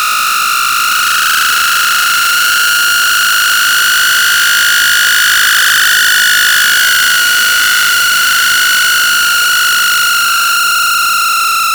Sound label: saw scale